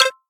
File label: Undock, ui; i-mobile i-style 711